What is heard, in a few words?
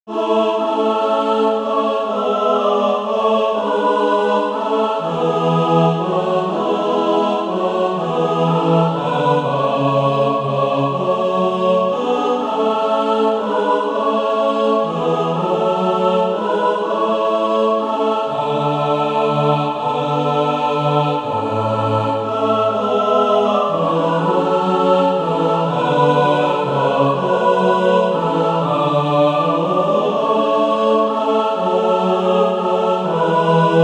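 bass, Practise Music; All Hail Ye Merry Folk Today; satb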